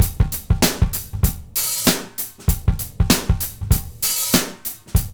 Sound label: DRUMS; Funky Ass Loops; East West